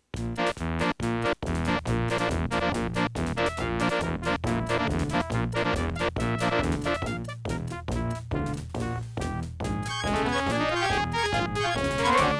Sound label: Vibra 16; Day of the Tentacle Opening